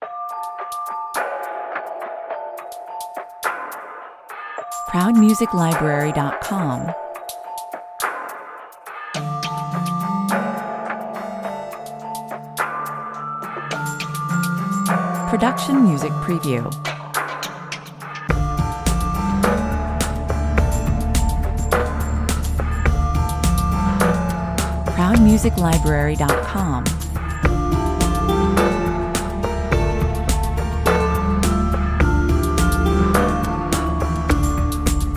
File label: orchestral spooky suspense 105 bpm